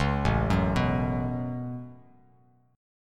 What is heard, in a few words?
A 6th Add 9th A down Bass Standard; A6add9; arpeggiated